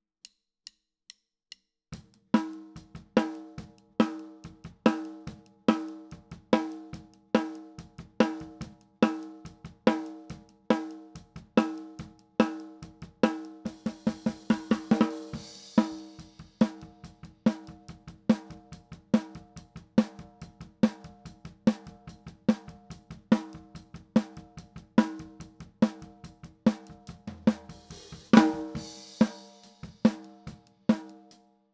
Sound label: Snare Top